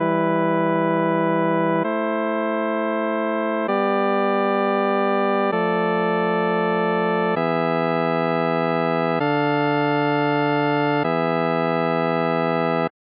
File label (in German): phrygische kadenz